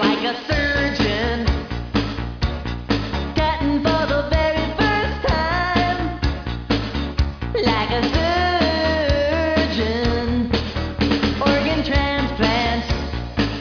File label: jazz